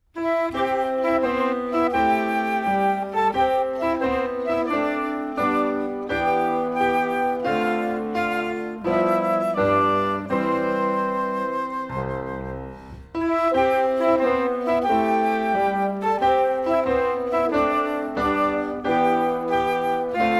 music only

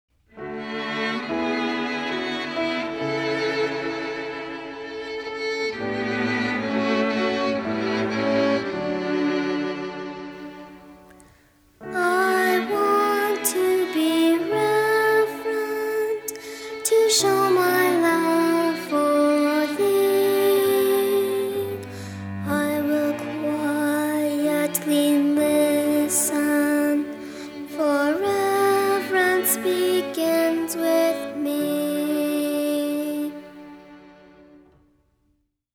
i want to be reverent words and music

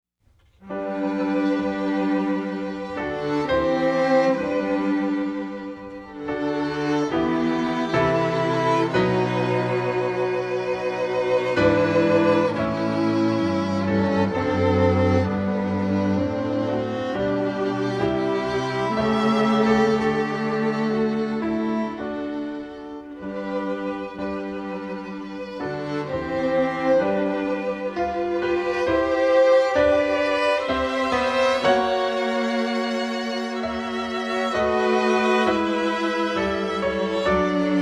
morning prayer prelude music only

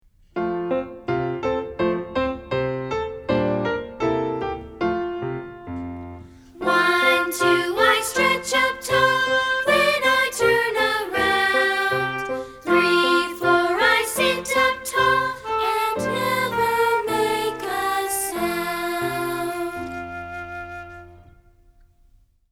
to get quiet words and music

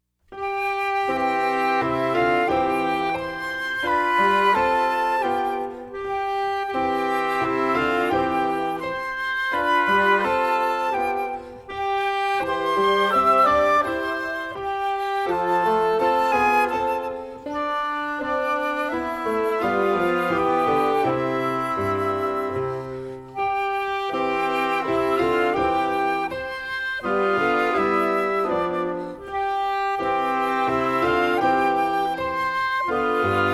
prelude music only

